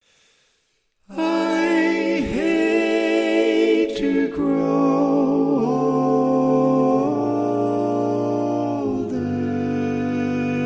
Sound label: Lead, barbershop